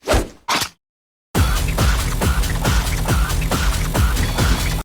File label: pirate rap loop; rimes